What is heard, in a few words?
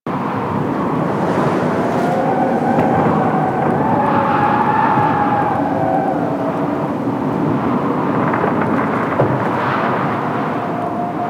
Ice Exterior Amb; Warframe; Corpus; Ice Planet